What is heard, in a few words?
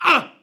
man injured grunt; soundscrate